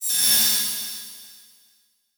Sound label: Title Transition Feedback Blast; Adobe; Production Element